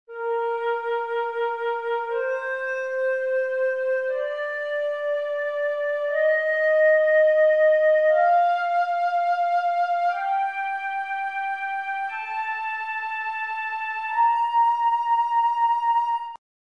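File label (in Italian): si bem maggiore, scala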